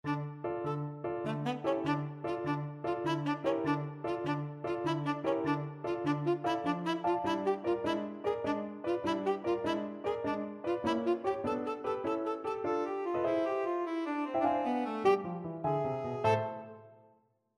tenor saxophone

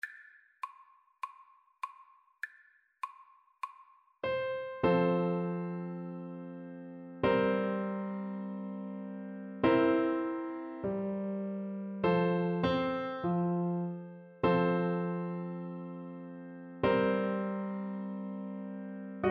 Oboe

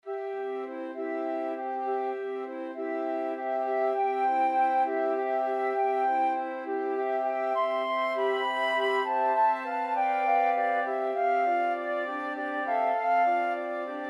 flute choir

